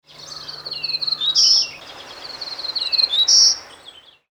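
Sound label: Black-throated Green 2 songs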